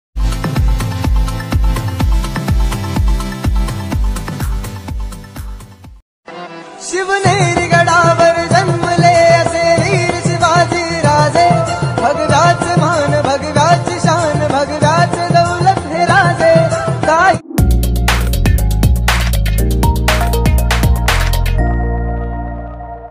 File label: marathi ringtone